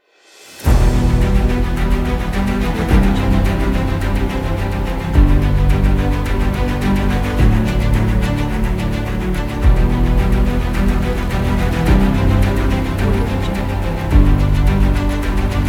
hero; luiza d

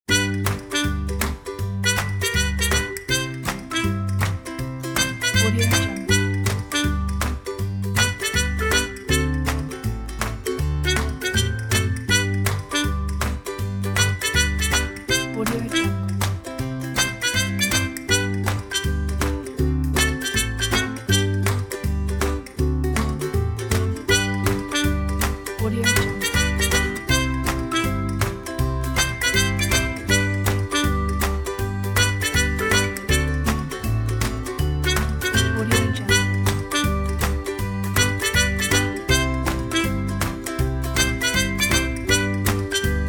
happy clapping ukulele

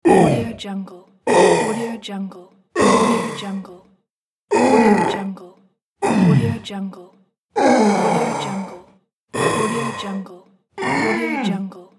monster dying

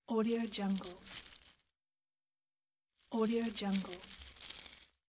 necklace with small stones

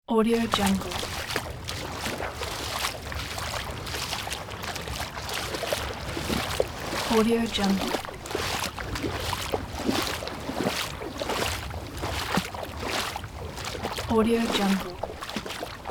walking in ocean

